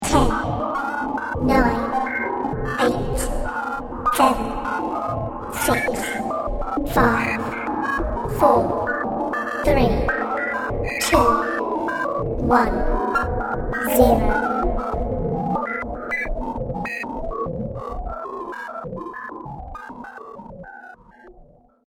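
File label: Science Fiction Countdown Computer; Sf Countdown - Starcraft Computer; star wars / trek style games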